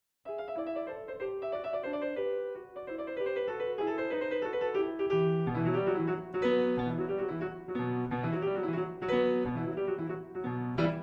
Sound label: Tarantella; 1848; Heller; Op.46, Nr.7; piano